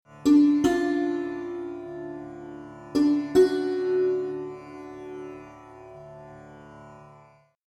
Swaras 3 Ga Drone; ragas; ragajunglism

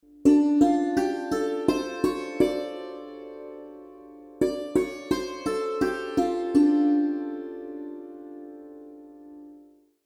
Hemshri; murchanas

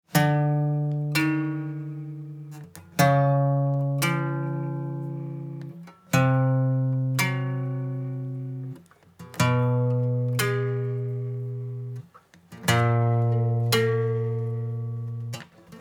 Downstring Separations

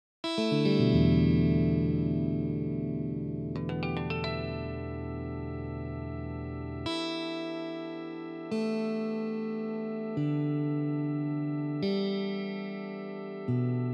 Inverted Tuning; crowdsourced tunings; ragajunglism

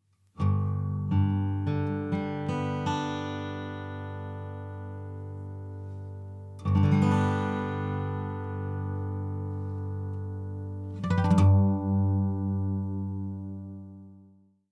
Night Tuning; ragajunglism

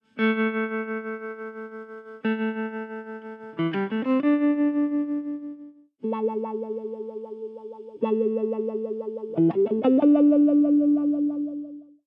Tremolo vs Wah; creative wah pedal use; ragajunglism

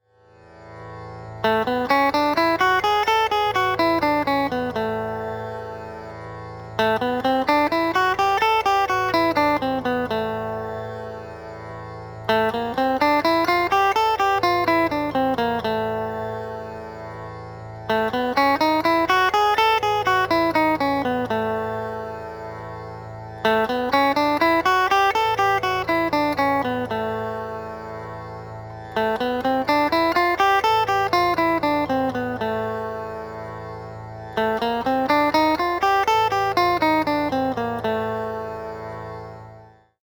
THAAT Church Modes

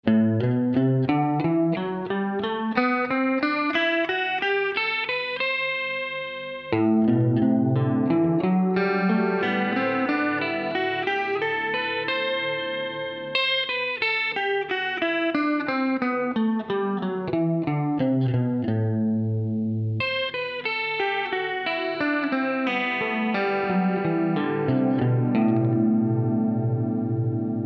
Harp Dorian Comparison; open string awareness; ragajunglism

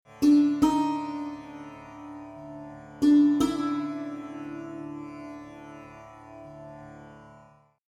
Swaras Re Drone; ragas; ragajunglism

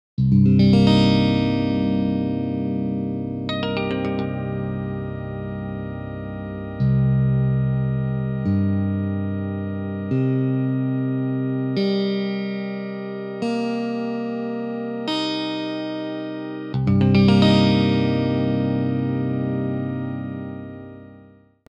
Crowd CGCGBbEb; crowdsourced tunings; ragajunglism

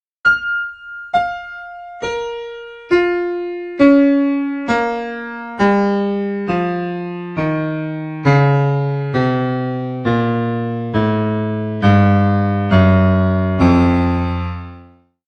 Undertone Series Subharmonics F; ragajunglism

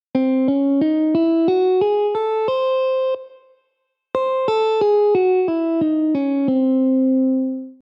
Superlocrian Diminished; scales

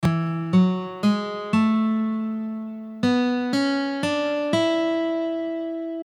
Tetrachords E; ragajunglism

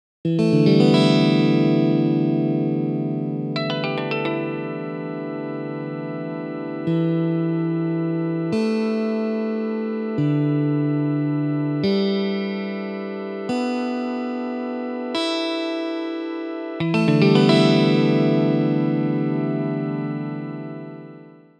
Crowd EADGBE; crowdsourced tunings; ragajunglism

